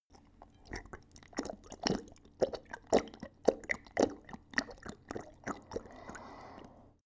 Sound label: dog drink; natur-tiere; hunde